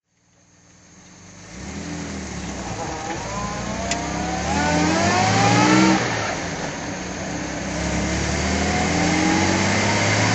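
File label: jaguar xk150 fhc drive, engine sound; jag xkhc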